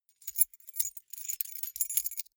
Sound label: key ring small rattle hard